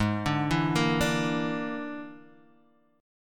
E 7th Flat 5th, Ab

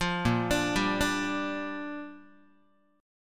A sharp 6th, Guitar; arpeggiated; JGuitar

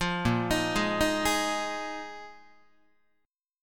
A sharp 7th Suspended 4th, Guitar; arpeggiated; JGuitar

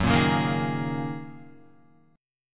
Gb 6th Add 9th Gb down Guitar

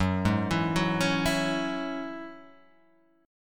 F sharp 7th Suspended 2nd, Guitar, Half Step Down

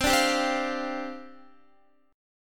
C Suspended 2nd Suspended 4th, down, Guitar

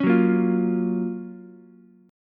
F sharp Major 7th, Ukulele; strummed; JGuitar

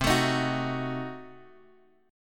C Diminished 7th down Guitar DADGAD

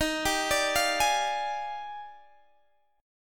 D sharp Major 11th, down, Guitar, Standard; arpeggiated; JGuitar

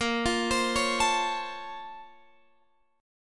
A sharp Minor Major 9th, down, Guitar, Open F; A#mM9 arpeggiated; JGuitar

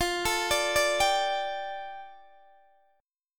F 6th Add 9th F down Guitar DADGAD, chord

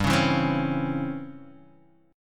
Db Minor Major 7th Flat 5th G

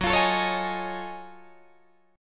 Gb Minor Major 13th Gb down Guitar DADGAD; strummed

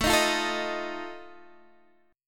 D sharp Suspended 2nd Flat 5th, chord

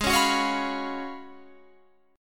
Gb Major 7th Suspended 2nd Suspended 4th

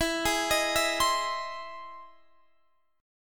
E Augmented Major 7th E down Guitar Standard; arpeggiated; E+M7; JGuitar

